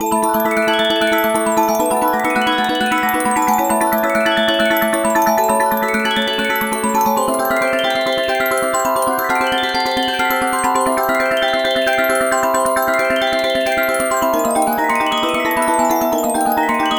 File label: freespin Win Loop; eggomatic